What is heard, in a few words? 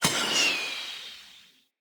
Bow Arrow By; Weapons; Josalfar Bow